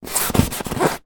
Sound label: polystyrene block scrape rub hand; foley; zapsplat